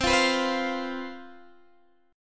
C Major 7th Suspended 4th, down, Guitar, Half Step Down